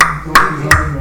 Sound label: klack